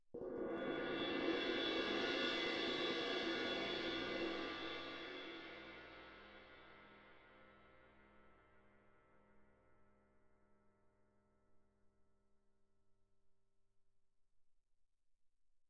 20 crash, mallet, roll, suspended cymbals; Percussion

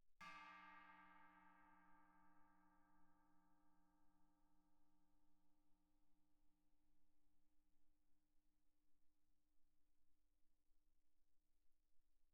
chinese stick shoulder pp, suspended cymbals; Percussion

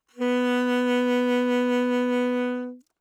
Alto Sax vib ff B3, Eb Alto Saxophone; Woodwinds; MIS Pitches - 2014

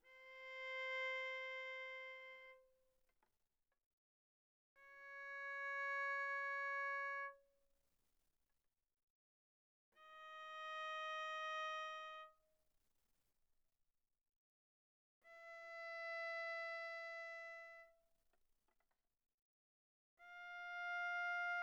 Viola arco sul A pp C5 B5

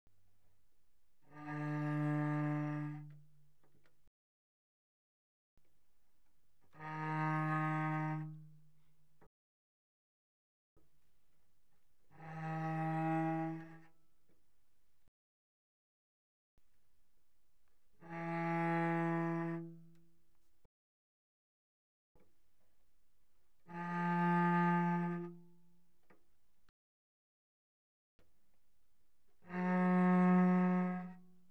Cello arco pp sul C D3Bb3; Strings

